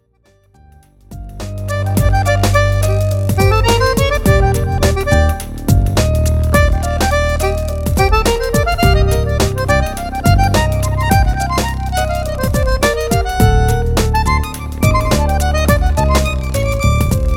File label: Tanguito